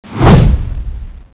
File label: attack, giant; dione